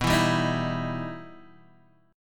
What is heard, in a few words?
E Minor Major 7th